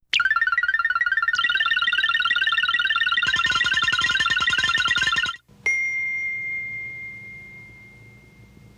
toteboard sfx

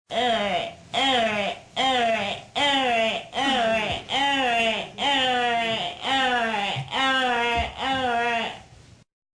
moan

